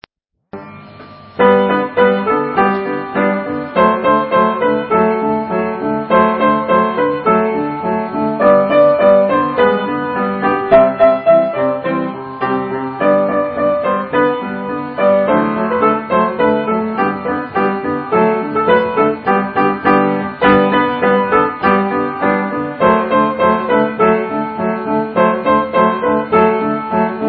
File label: piano